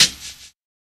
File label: Shake Place; FREE SFX PACK VOL 2